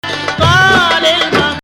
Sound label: Nahawand; Maqam Rast